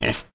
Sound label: piglette oink; Angry Birds Space